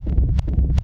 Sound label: wing; Mob